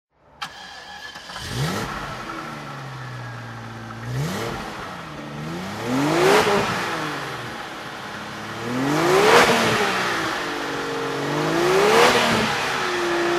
jaguar xjs V12 coupe throttle, Engine sound; Jaguar XJS V12 coupe, 1993; V3D/ ClassicarImages

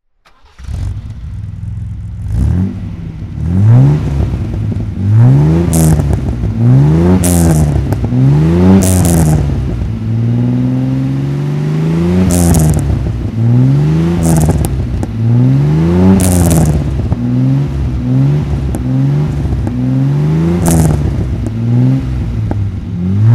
MG MGC GT rally throttle

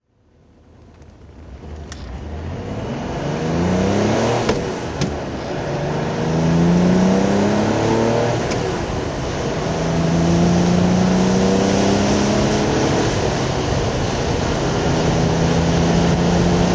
porsche 911 2.4t injection drive; Porsche 911 2.4 T Targa, 1973; Engine sound driving; V3D/ ClassicarImages

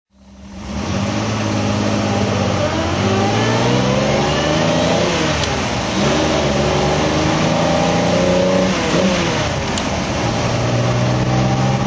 austin healey 3000 mkIII ph2 drive

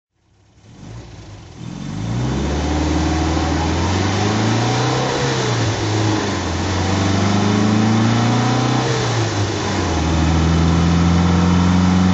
mga drive

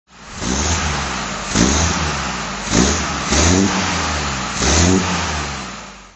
lagonda m45 throt

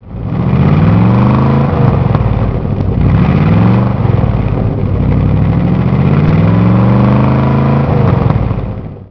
stag throttle

